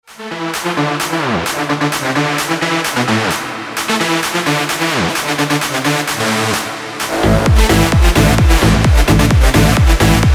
רינגטון

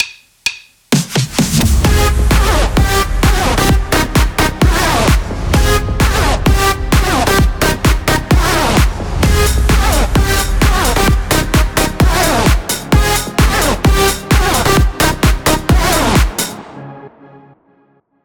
דרופ

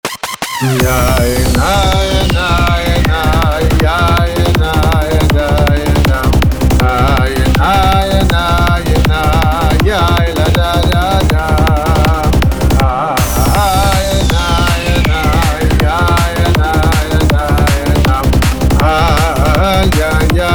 רמיקס

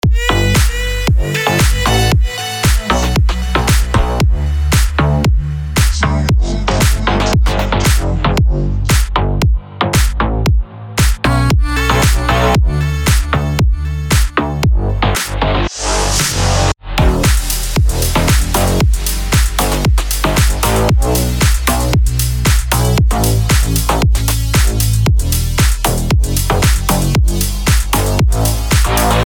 בלי וואקאל

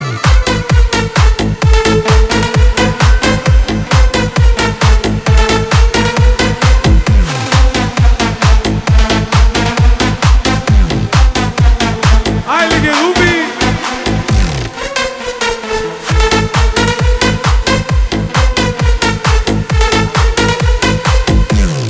רינגטון

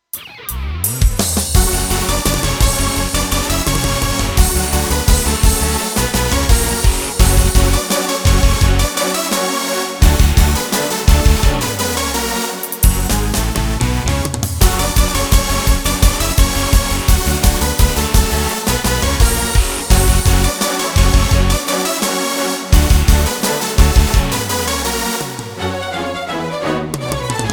פתיח כניסת חתן

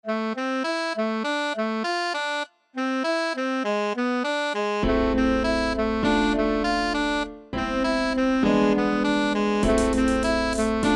ביט מגניב

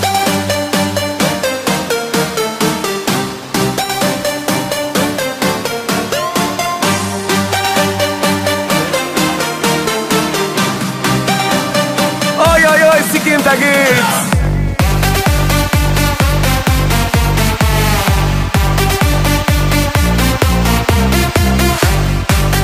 מחרוזת דאנס

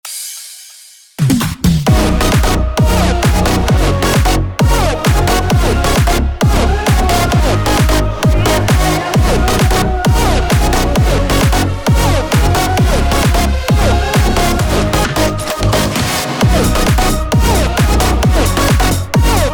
רמיקס דרופ

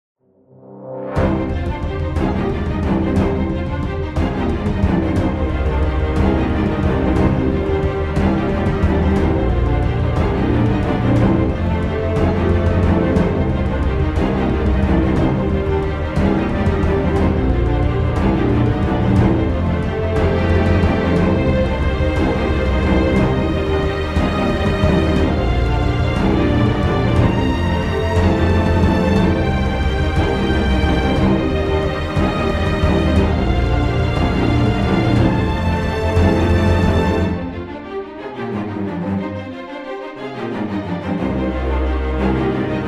דרמה

